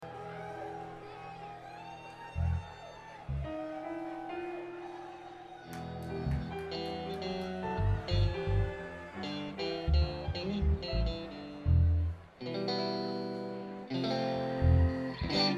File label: Tuning Intro